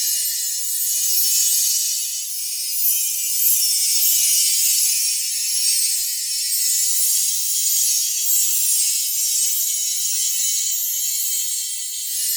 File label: Clown Barrel Loop; Gameplay; Deep Archimedea; Warframe; Nineteeen Ninety Nine; Deep Archimedia